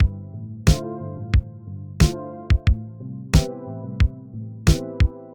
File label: Dance Loop; Game rip; MiSide